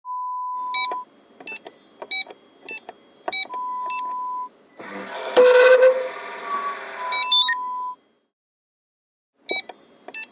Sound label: Safe sound